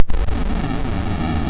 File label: sid 6581; Slinky